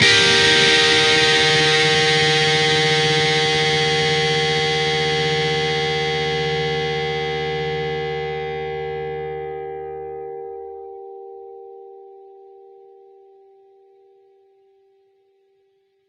akkord sol minor 2 oktava s dobavleniem visokoj noti

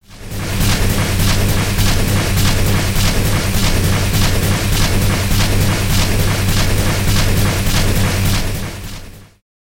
porshen dvigatel

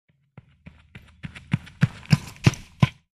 beg na bazu v bejsbole

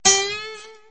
gitara odinochnij blyuzovij

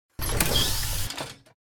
otkrivaniya dveri metro metropolitena

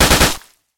pulemetnij vrazheskij ogon

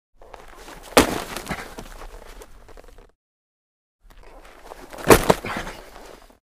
amerikanskij futbol zashitnik blokiruet protivnika

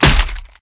snyatie oruzhiya i komplektuyushih

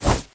korotkij legkij udar machete

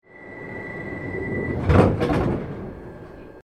metro nerovnie zvukovie effekti

